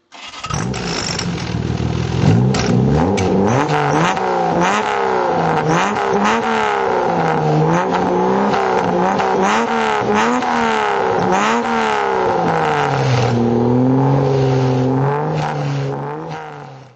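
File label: jaguar38 special throttle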